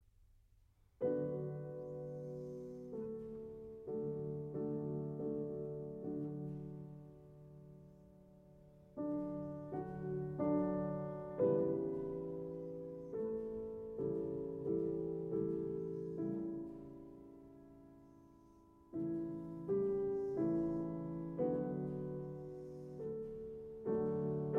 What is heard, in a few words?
piano sonata in a major